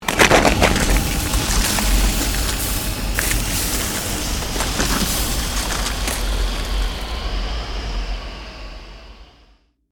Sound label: CREATED MAGIC SPELL BLIZZARD SHARDS; A series of magic or arcane spell sounds designed to suit fantasy themes, Arcane,Created,Fantasy Magic,Ice Magic